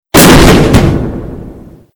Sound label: fireworks spark; tf